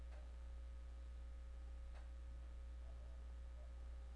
knock

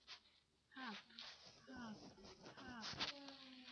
harp

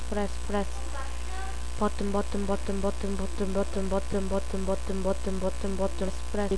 button

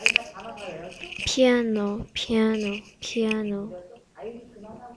piano

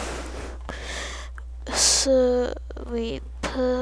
sweep

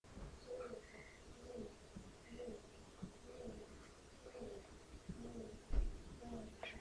rain

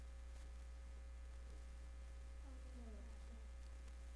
groan

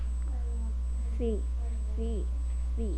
sea

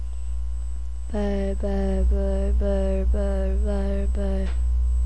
bell

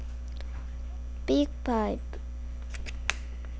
bagpipe

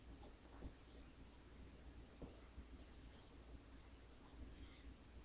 breath

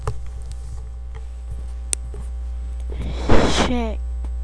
shake

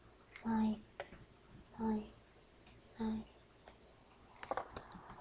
knife

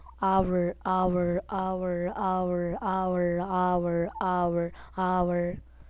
owl

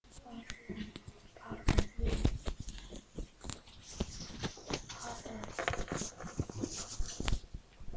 bottle

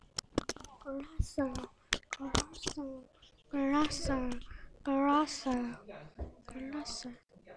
glass

